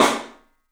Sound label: PIC SNR L, PICOLO ROOM, 3D Drums; 3D Sound